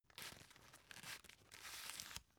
tissue take soft

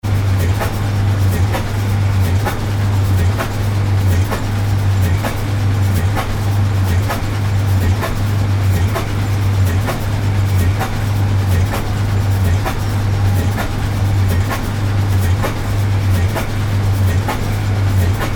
steam engine

